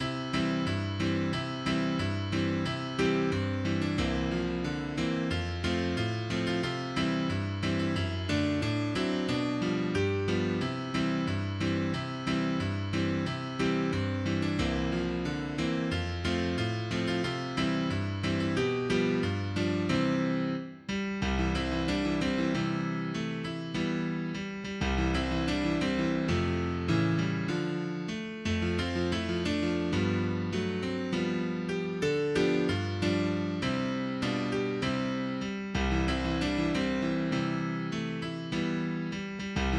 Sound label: jingle bells; pierpont james